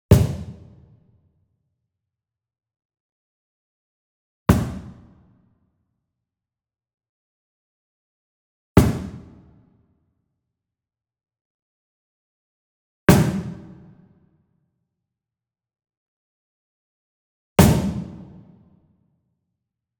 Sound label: Wood Percussion Cajon High Hit Slap Medium; boom library; Cinematic Strikes Construction Kit